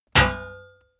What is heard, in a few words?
metal impact sword plate; hits explosionen; metall